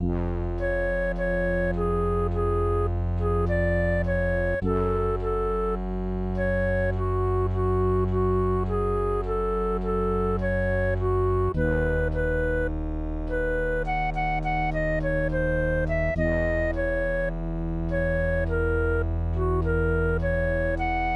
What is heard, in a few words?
MIDI